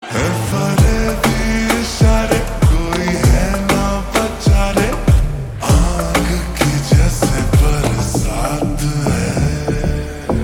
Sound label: Slow Reverb Version Ringtone